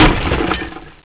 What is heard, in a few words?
ice break; Nokia; 5800 XpressMusic; Bounce Touch